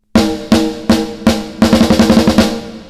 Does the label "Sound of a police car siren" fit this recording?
No